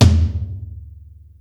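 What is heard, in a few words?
tom rock mid, Yamaha Motif ES-Series; 300 Drum Machines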